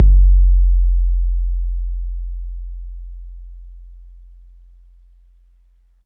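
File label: bass drum 808 MPC60 Click F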